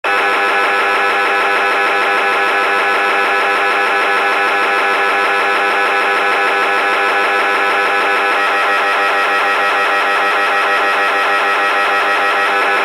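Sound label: sounds of MRI tomography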